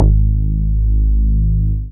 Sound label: BAS MINI, Mini-Moog